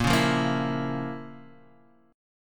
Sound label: F Major 7th Suspended 4th Sharp 5th A sharp